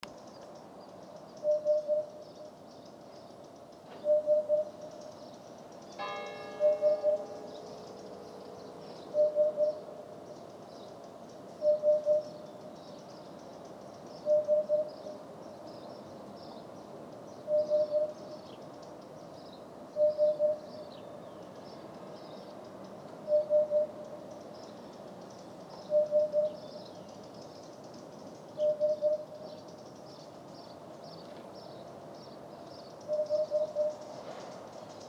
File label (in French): huppe fasciée